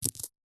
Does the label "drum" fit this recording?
No